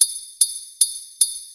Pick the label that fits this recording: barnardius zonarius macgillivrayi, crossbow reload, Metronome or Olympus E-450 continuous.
Metronome